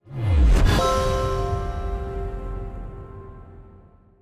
stinger gift received

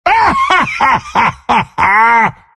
bearform laugh; Dota 2; lone druid

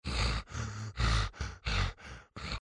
breathing; zh

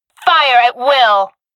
attack; Awesomenauts

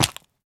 Turtle egg jump; minecraft

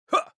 Grunt Jump; Lex; Paladins

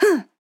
Grunt Jump; paladins; Ying

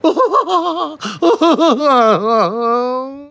Crying; Hedonismbot; futurama worlds of tomorrow

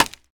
Scaffolding place; minecraft

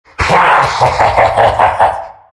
big laugh; Undying

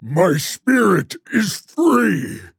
death; Shaman; Paladins

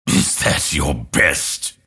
deny pain; grimstroke

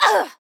Grunt Attack; Paladins; Evie; Troublemaker

